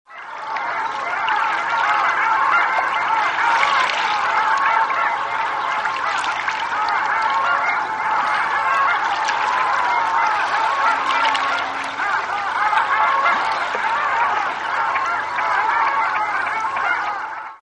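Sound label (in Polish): kolonia lodówek, głosy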